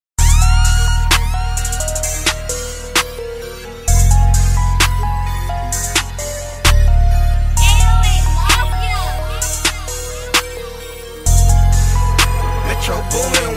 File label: 808 Instrumental